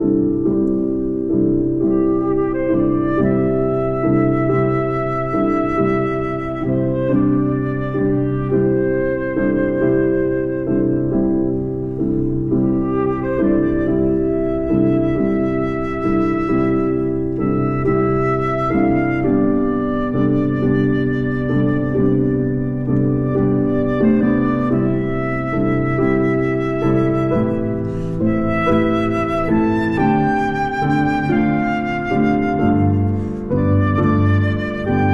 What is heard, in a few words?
piano, Pastorale for Flute and Piano